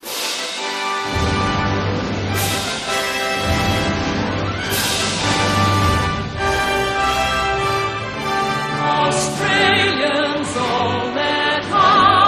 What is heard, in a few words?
australia anthem